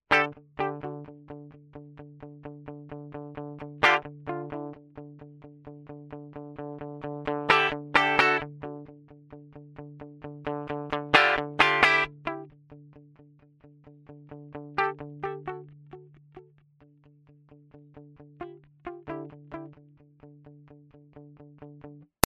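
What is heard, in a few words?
British crunch delay; DLY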